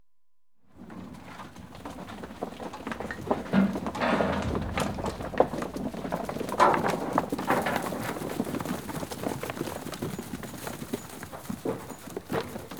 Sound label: Sheep Scurry Past - Exterior; Livestock; BBC Sound Effects Library